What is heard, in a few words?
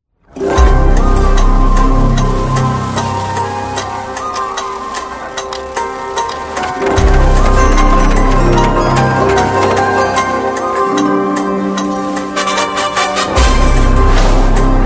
bgm ringtone title logo bgm; rc 15; ram charan